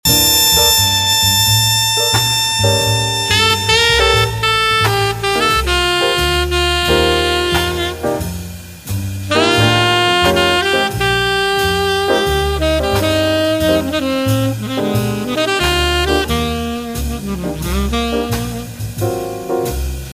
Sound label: Quintet solo